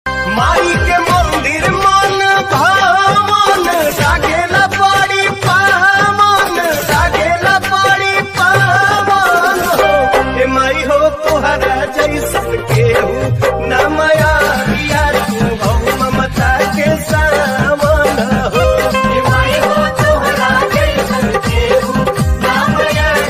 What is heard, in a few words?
BHOJPURI RINGTONE